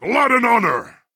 butcher attack; bangkorai

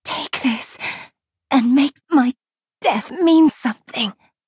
courier last gasp; varanis

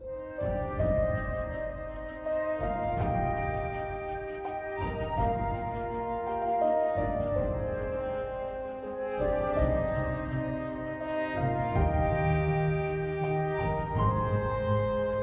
Leitmotif

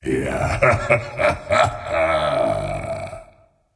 Laugh; Molag Bal

